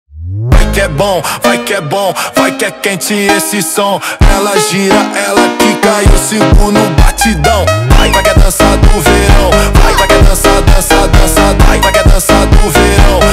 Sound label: phonk